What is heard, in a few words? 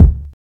short reverb steel kick drum c sharp key